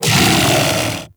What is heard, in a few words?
Kubrow Armored Vocal Alerted; Warframe